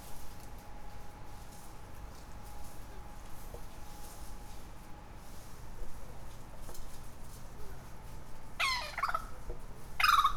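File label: Bush Turkey call, exterior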